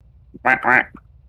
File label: quack, horns